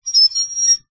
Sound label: foley metal sharp scrape squeak shrill